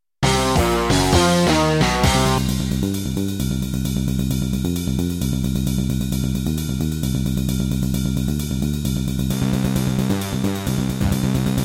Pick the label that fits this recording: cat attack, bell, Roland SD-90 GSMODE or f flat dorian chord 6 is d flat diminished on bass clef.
Roland SD-90 GSMODE